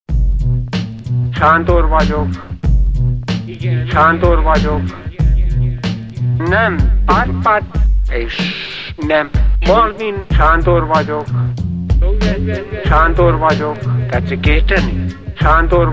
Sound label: rap